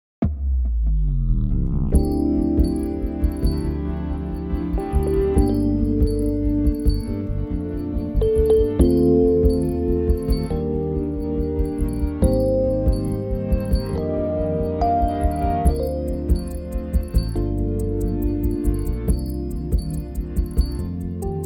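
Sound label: Bb smooth, Backing Tracks for Male Vocals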